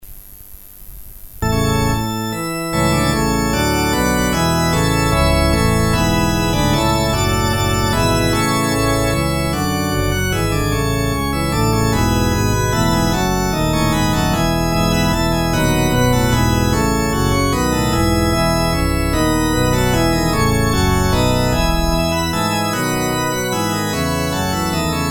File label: organ interlude